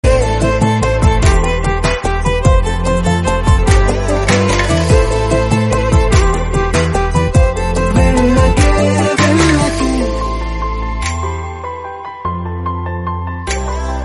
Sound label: Telugu Song Bgm Ringtone